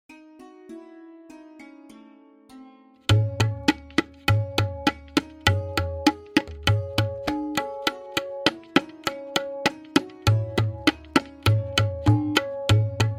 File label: Variation 1-Played with Lehra, Benares Kaida 1, Tintal; tabla